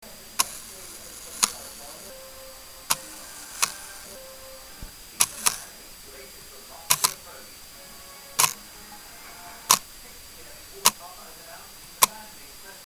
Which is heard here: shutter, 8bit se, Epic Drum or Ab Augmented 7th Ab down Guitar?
shutter